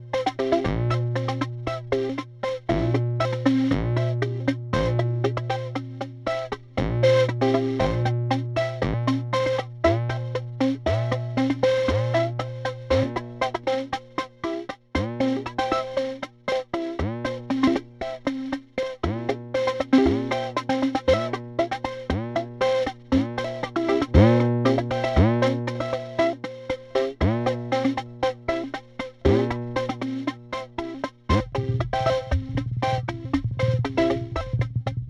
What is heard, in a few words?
Beebo Resonance Band; Poly Effects